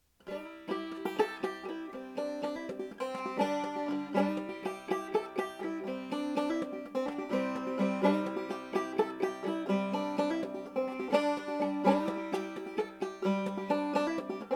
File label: banjo